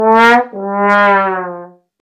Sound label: sad trombone